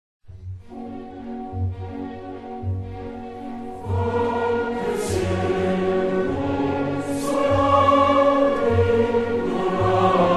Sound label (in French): Choeur des Esclaves; Nabucco; Verdi